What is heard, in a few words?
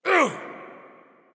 grunt; Smite; bacchus